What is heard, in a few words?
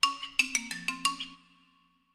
Tickety tock, Ringtones; Samsung Galaxy S3